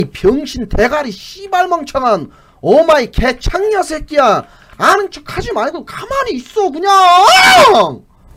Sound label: scout positive vocalization